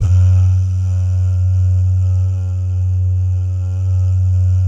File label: "bah", Vocal Jazz Fx